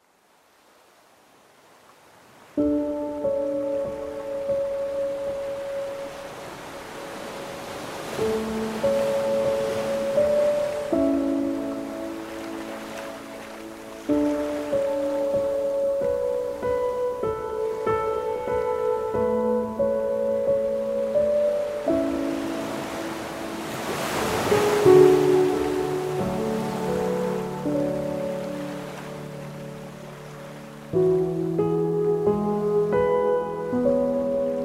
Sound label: Piano Ocean Waves